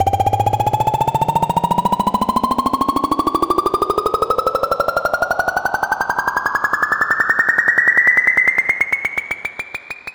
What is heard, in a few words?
Trap Riser - 160 BPM G; FX; Risers; Cymatics; EDM Starter Pack